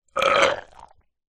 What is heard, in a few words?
human male burp soda disgusting; zapsplat